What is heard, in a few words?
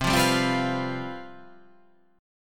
C Major 7th Suspended 4th